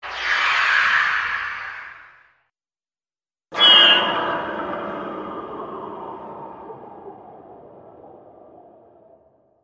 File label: Metallic Screams X2, whooshes & swishes